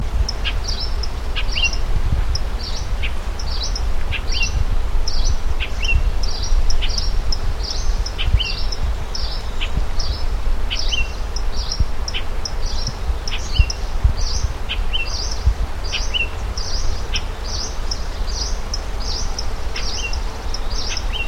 suara panggilan berkecet leher merah

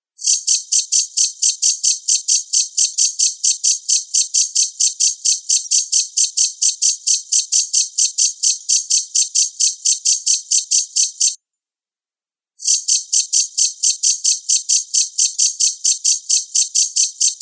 suara aneh empat

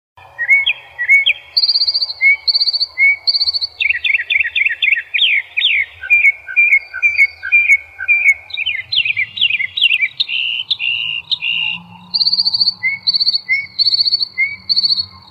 kicauan burung northern mockingbird

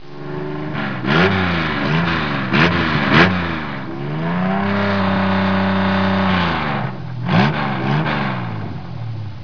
suara mobil

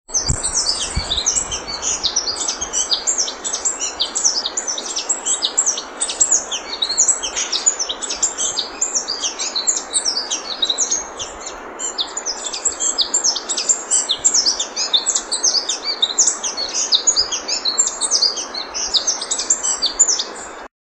burung madu gunung

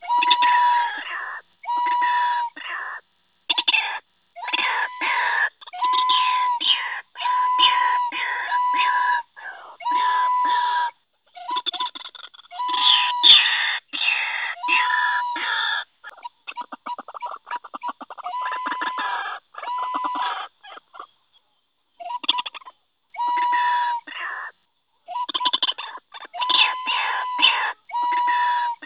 suara ruak ruak pikat

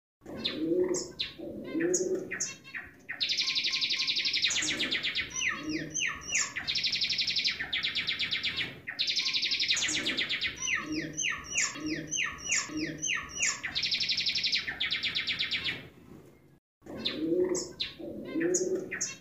suara tembakan gelatik jawa

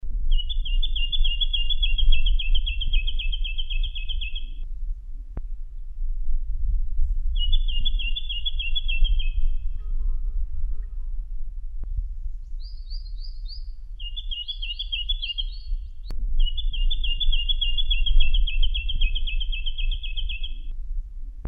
suara jacky winter

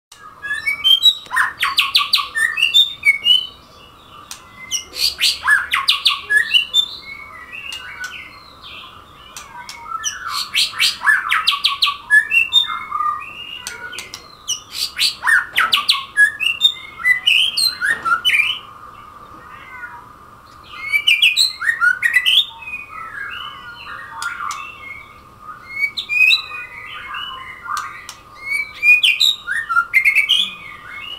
suara murai langkawi

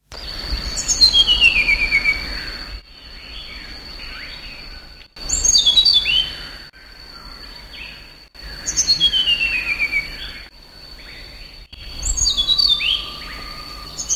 sikatan cacing

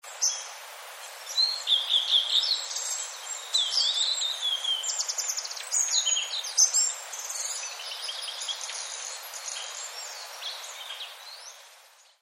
tledekan laut

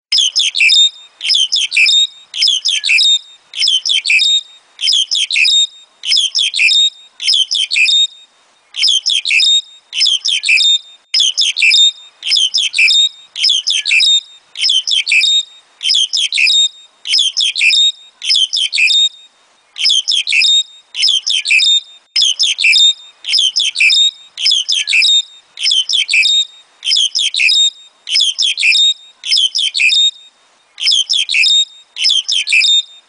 jalak hongkong

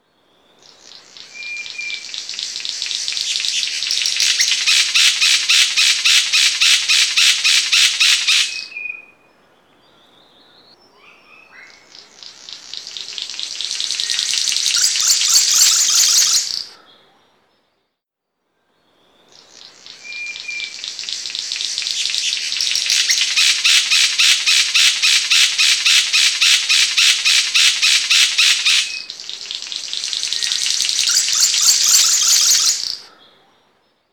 cenderawasih panji

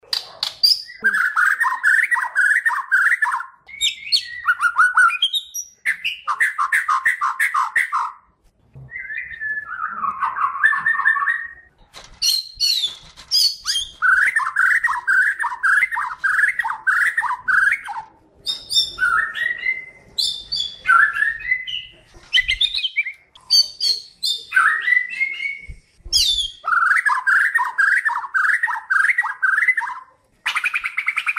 audio murai haji

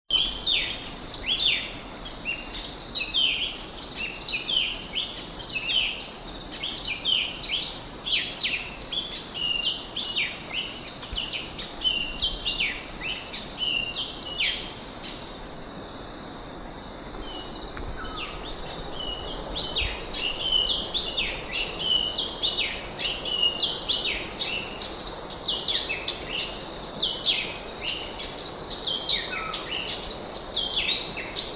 suara giant sunbird

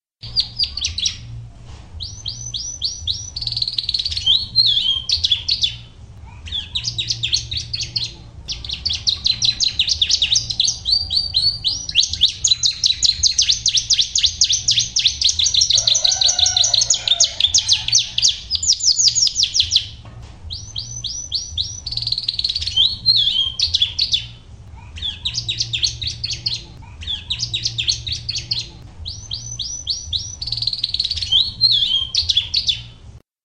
kenari isian ciblek

